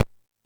Timbale, E-MU Pro-Cussion

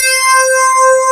pluck C5, FM pluck

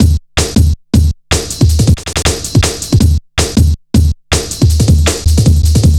160, Drum Loops; Total Drum&Bass

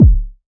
BD, Waldorf Blofeld

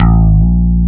BASS, Drum & Bass, 135 BPM; Ueberschall; Drum & Bass Resonance

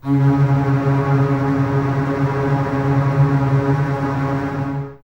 C#3, Basses Tremolo, String Ensembles; Miroslav Vitous; 90 s Sample CDs

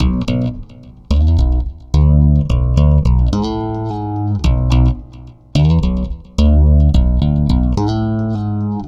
Stick Loop, Stick Bass

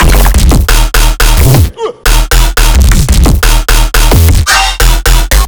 F Bass Loops

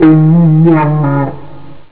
elec gt ornam; jazz jackrabbit 2

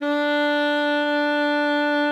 D4, alto sax; Xsample VOL-2

